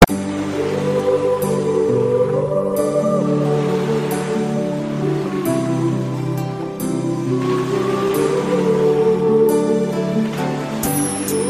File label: Awesome Sad Sound